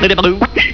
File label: giggledy, cartoons